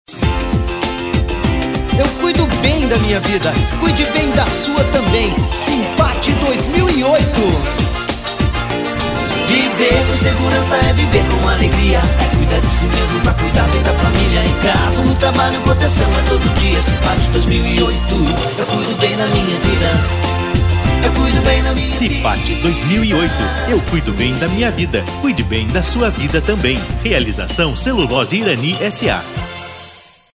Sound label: jingle; sipat irani 2008